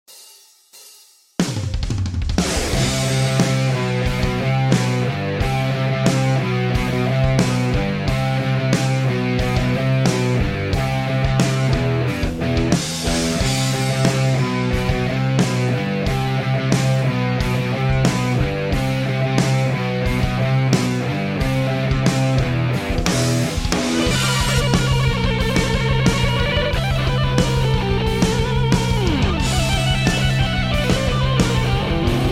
Live Guitar; The Last Merc Standing

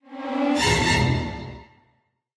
Horror Stings; lego the incredibles 2018 pc

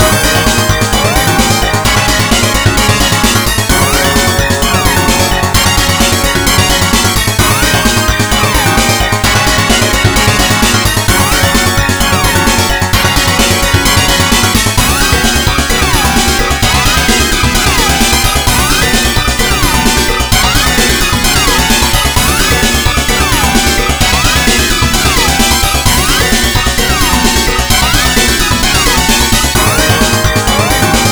BGM Battle; Sega Battle; Irodzuki Tingle no Koi no Balloon Trip; NDS

